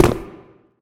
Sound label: cave grounds fall; Golden Crayon Sounds